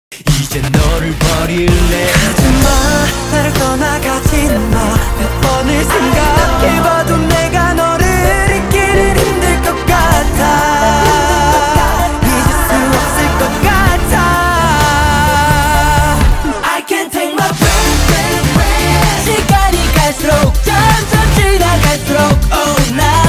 breath beast

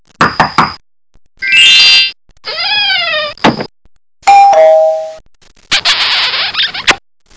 Yahoo Messenger

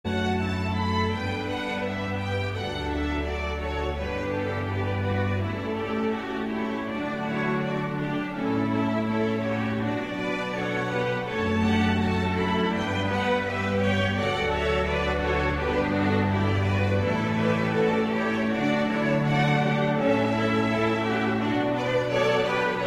canon in d major

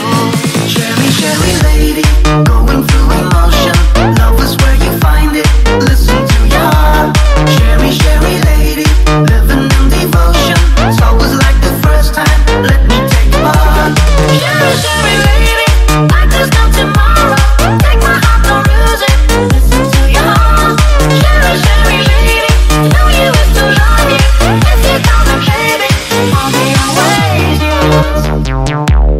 Remix TikTok

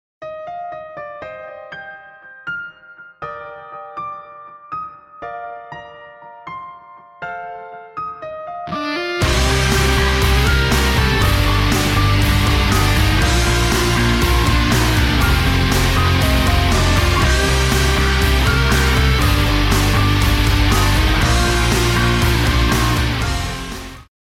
mermaids guitar

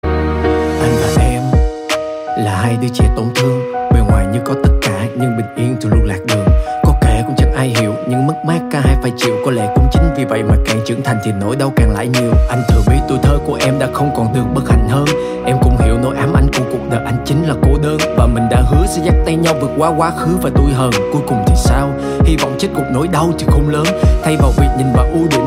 RAP

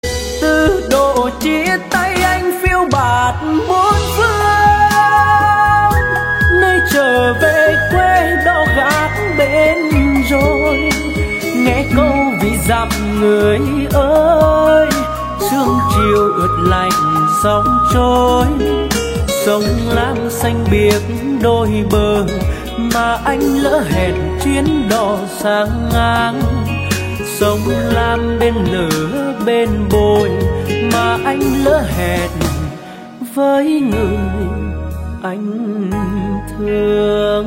Remix TikTok

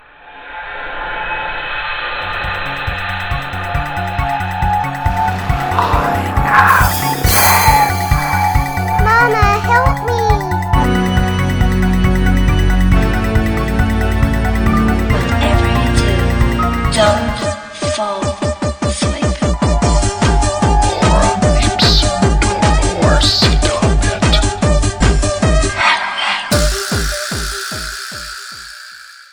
halloween horror mix

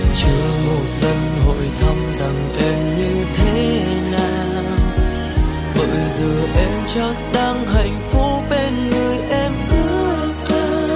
Lofi

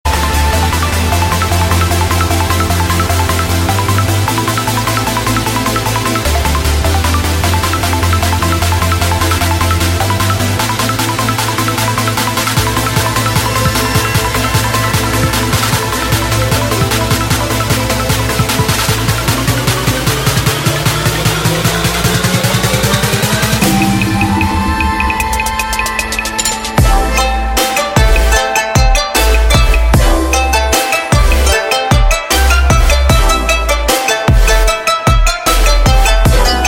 edm

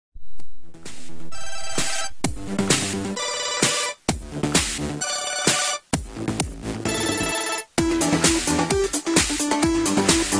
samsung

